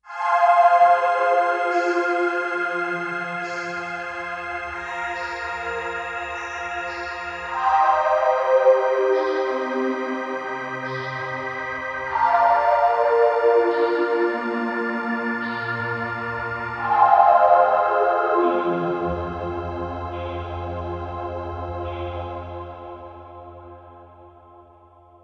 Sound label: Kawai K5000S; TheDeep